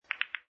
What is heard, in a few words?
Billiard Pool Snooker Three Ball Collissions; Pool balls, snooker balls, billiards balls; Sports; Lynne Music